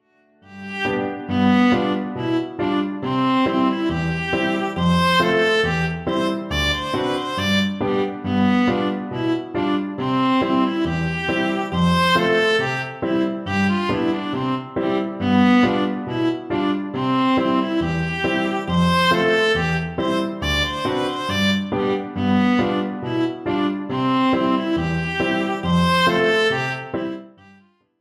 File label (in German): gavotte c dur vla